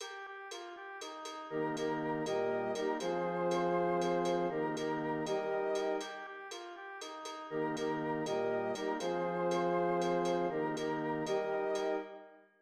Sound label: tutti; Orin Odara; Yemaya Ago Yo A E